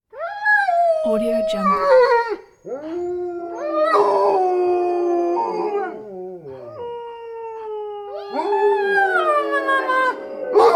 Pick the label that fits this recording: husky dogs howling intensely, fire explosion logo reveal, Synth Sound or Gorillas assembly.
husky dogs howling intensely